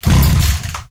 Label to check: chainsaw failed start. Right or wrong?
Right